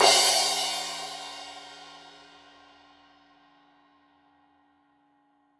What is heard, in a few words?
Crash 15in L edge, Cymbals Stereo